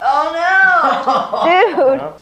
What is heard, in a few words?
"oh no" LAUGH; Voice